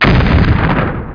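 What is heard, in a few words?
sound explosion; MOTS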